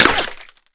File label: ricochet; Escape from Tarkov